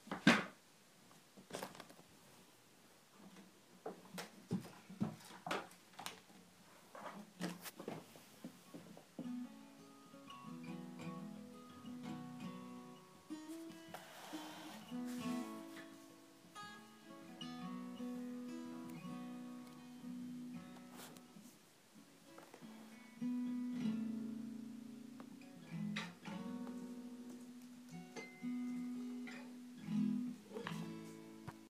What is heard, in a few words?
Guitar, Practicing Guitar